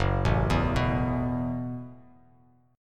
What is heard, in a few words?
Gb Minor 11th Gb, Bass Standard, chord; arpeggiated; JGuitar